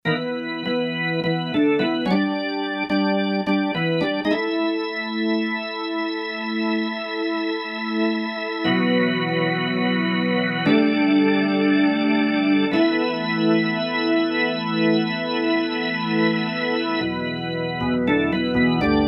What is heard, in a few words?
5TH ORGAN, Roland SC-880; Factory Patches